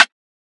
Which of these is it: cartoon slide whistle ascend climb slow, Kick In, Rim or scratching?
Rim